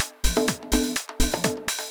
Dance Grooves

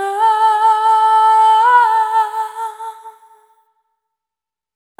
Single Voice Melodic Loops, Vocal Samples

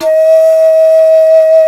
shakuhachi D#3; InVision Interactive; Lightware VOL-1; Instruments & Percussions

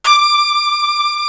SOLO VIOLIN; East West; Global Sounds

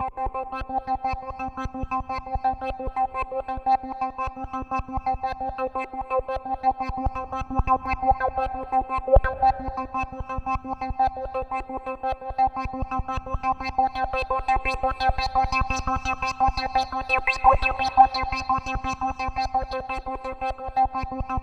C3, CLUB ORGAN; Roland JV 2080

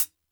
TING LP; AMG Gota Yashiki Groove Activator; TING LOOP 74

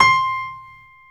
C5 STR PIA, G.PIANO; AKAI S-Series CD-ROM Sound Library VOL-7

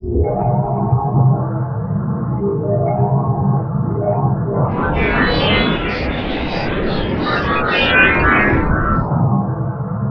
MAGIC, Disjointed Textures; USB Soundscan vol.33; AKAI

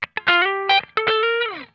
GLIDE, Funk Guitar; Zero G - Funk Guitar

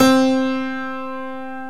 TACK PIANO

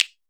F.SNAP, FINGER POPS; AKAI S-Series CD-ROM Sound Library VOL-5

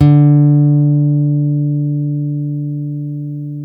STEEL, Guitars

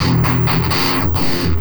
Treat Guit, Industrial Nasty

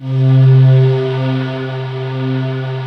pan pad C3; PANY PAD

